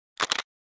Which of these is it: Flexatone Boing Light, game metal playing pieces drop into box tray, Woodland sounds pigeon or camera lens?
camera lens